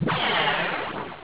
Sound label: shrink